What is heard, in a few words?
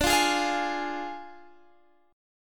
D Diminished Guitar Standard, chord; Ddim strummed